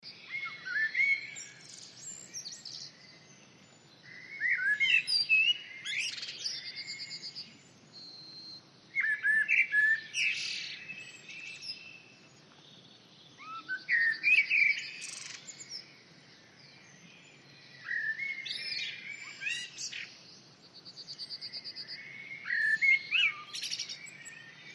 Blackbird Wiltshire; Blackbirds U.K.

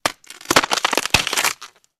Bone Cracks Bone Crac; punches kicks hits bones

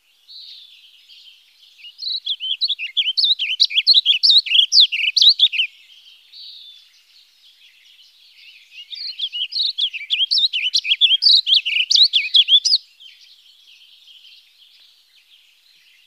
Vireo Warbling Calls

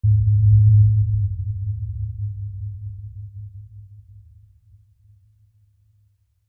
Bass Impact Tonal So; impacts

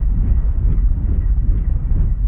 Propeller Movement Under

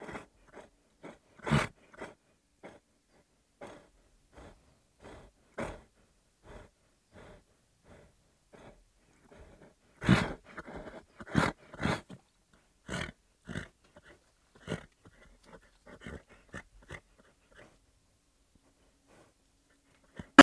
Horse Breaths Light M

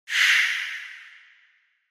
Air Release Hiss Rev

